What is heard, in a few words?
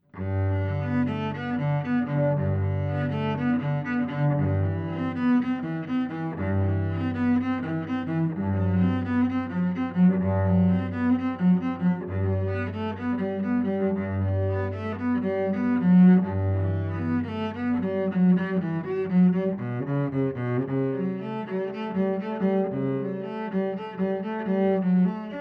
cello